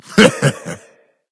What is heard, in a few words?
heh heh; Smite; cabrakan